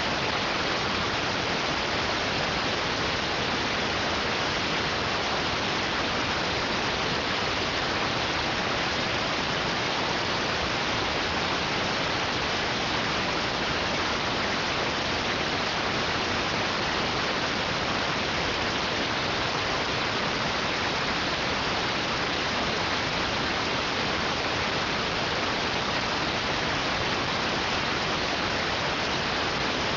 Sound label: rtty rx